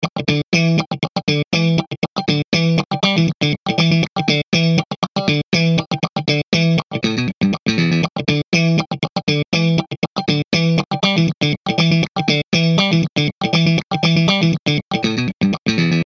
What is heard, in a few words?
Edited Loop; loop-based music